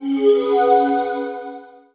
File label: Start round; Zenses Rainforest